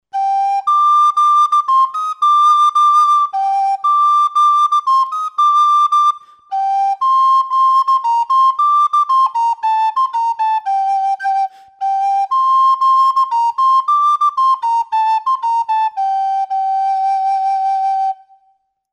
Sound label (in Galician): trad, Pito Galego